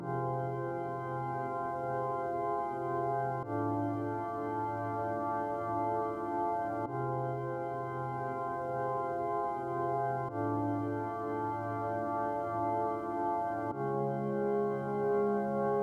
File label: flux arps test loop; freeflight